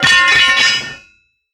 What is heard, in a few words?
drop weapon